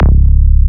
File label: Psy Trance Bass C 1 Bass Psytrance